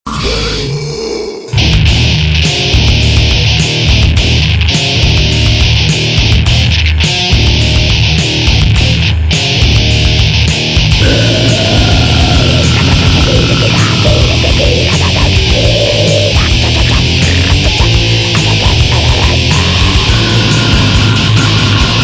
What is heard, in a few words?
drum machine gun